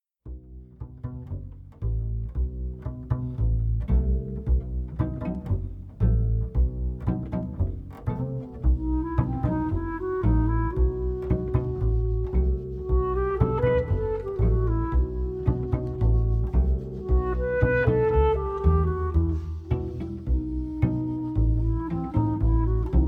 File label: klezmer; kazimierz klezmer standard of jewish district in cracow